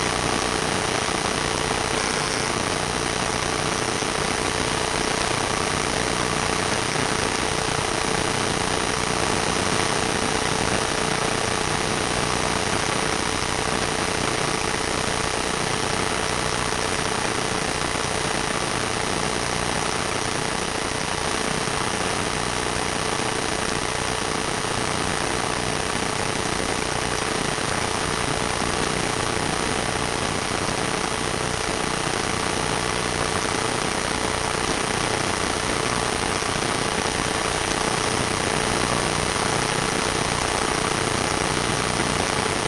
emf desktop computer; sonniss gdc

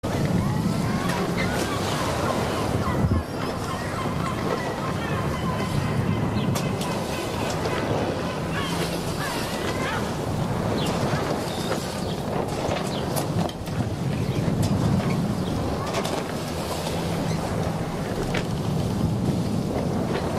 Sailing Ambiance; baileywiki maps premium towns

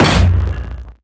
impact; bluezone

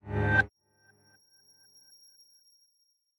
melee attack impacts magical effects psychic

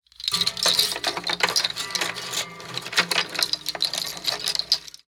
zither african shaker movements; fvtt sonniss gdc audio

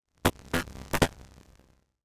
melee attack impacts magical effects lightning; psfx

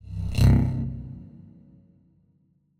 dark sci fi transition; sonniss gdc

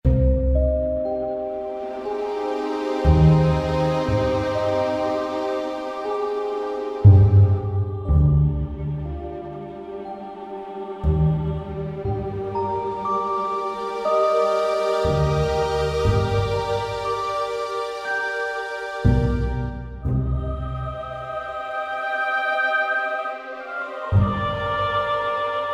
alchemy lab loop, music

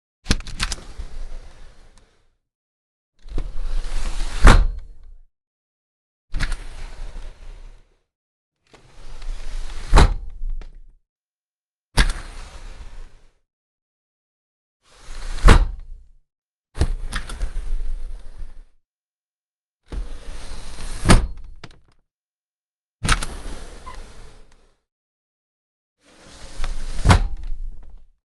door household open close sliding; fvtt sonniss gdc audio

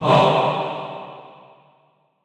church choir dm; sonniss gdc

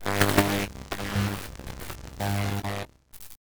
shocking grasp, cantrips

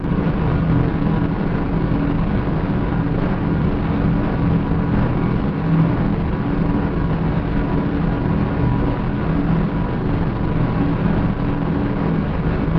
planetary exterior drone; sonniss gdc

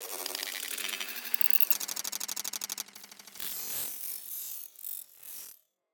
robot scan data; fvtt sonniss gdc audio

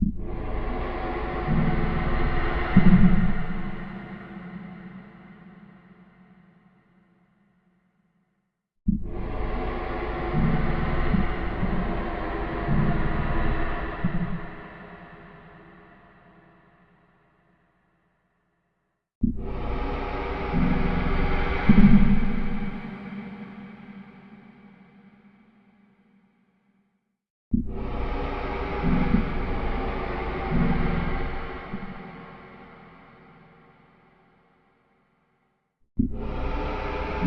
atmospheric element tension variations; sonniss gdc

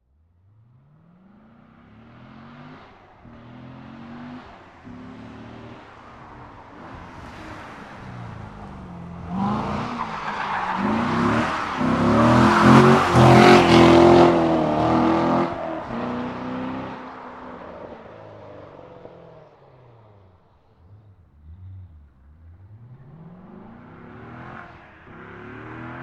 corvette turn and drift ext; sonniss gdc

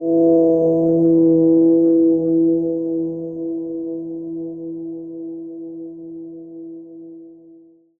granular abstract ambient element; sonniss gdc

